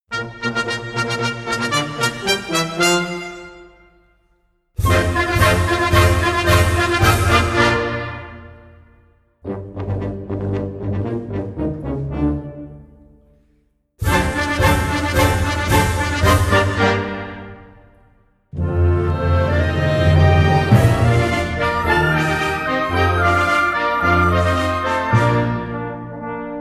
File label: italian national anthem; italian national anthem recording